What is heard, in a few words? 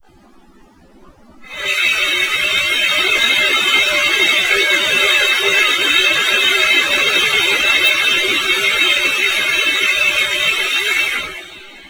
breathing